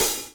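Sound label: open hi-hat Acoustic Crush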